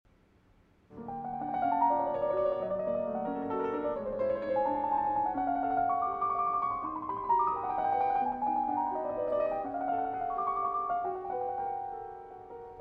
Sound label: Etudes No. 3 in F Sharp Minor